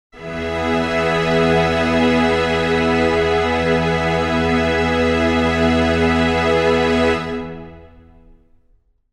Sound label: string chord e major